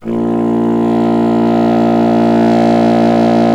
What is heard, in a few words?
C. Bassoon, solo instruments